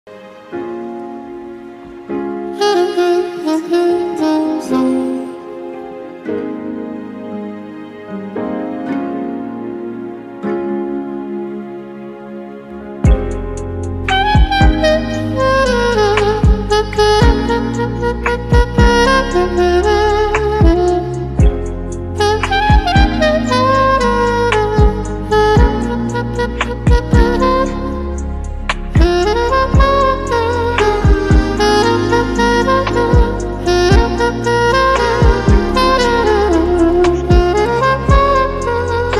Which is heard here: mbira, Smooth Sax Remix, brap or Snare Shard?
Smooth Sax Remix